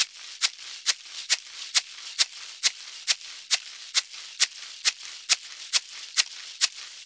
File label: Maracas, Festa Latina, Drums & Percussion; Best Service; Festa Latina VOL-1